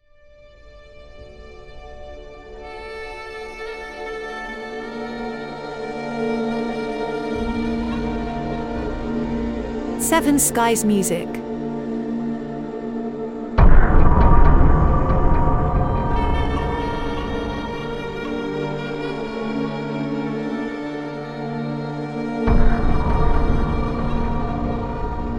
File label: Big Hollywood Dramatic Score